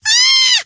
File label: fear, scientist female